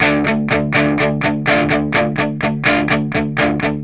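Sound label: 125, Vintage Blues Guitar rhythm; USB Soundscan vol.22; AKAI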